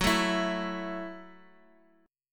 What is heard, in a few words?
F sharp Minor 7th F sharp, Guitar Open D